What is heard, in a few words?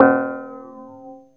ANALOG BELL; Zero-G; Ambient 1